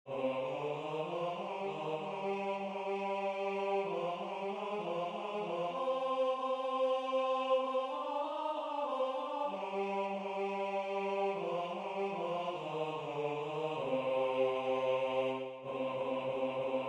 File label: bass